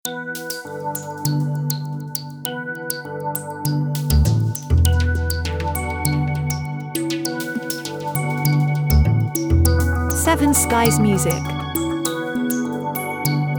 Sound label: Ambient Space